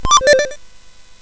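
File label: sfx, MOS 8580; hvsc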